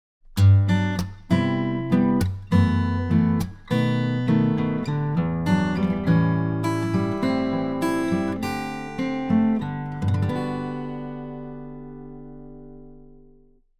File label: guitar AG finger